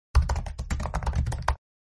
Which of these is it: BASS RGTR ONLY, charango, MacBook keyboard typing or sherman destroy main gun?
MacBook keyboard typing